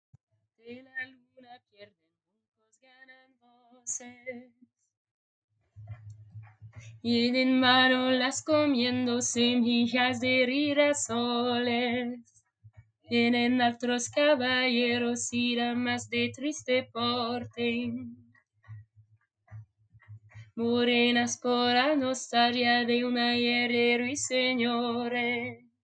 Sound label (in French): voix grave